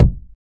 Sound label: kick drum single shot g sharp key; tuned drums